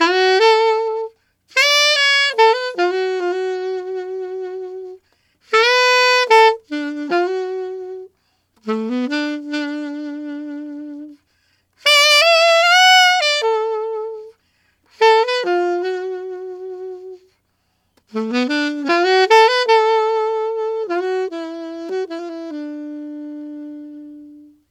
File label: Alto Rock Ballad in D maj, Sax; Sonic Foundry (Sony Creative Software)